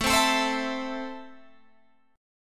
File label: A Minor, Guitar, Open G